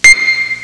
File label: piano